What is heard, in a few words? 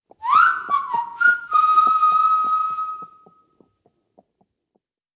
Free spin Outro Whistle; netent